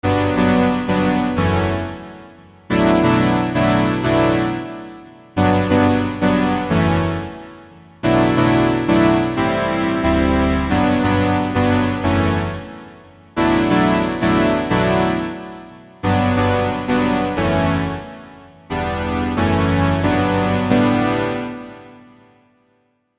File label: brass organ